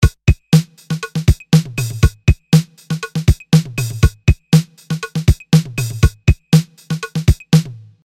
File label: Drumulator